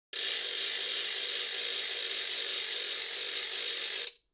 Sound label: ratchet